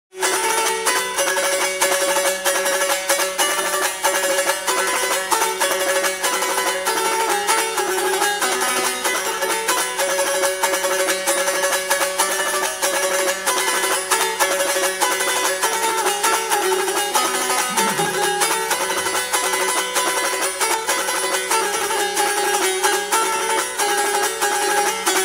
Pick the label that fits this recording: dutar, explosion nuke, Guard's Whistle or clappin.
dutar